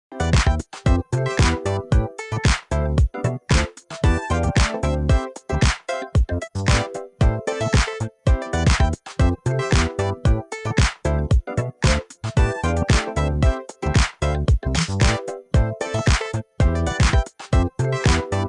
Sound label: electric vibes